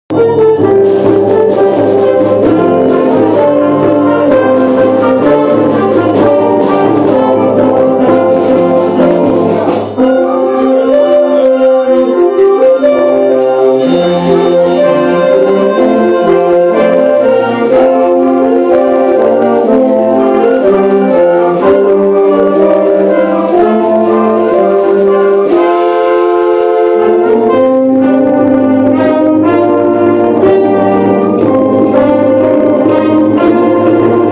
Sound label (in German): Orchester; USA